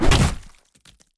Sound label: 2x4 Hit Gore; Scrap Weapons; Zomboid